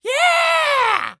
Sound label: Human Male Yells And Cheers Yeah